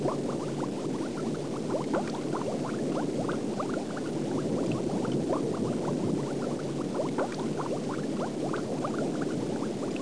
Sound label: bubbl mix